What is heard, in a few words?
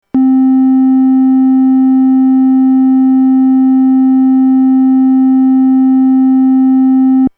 triangle, MIDI Synth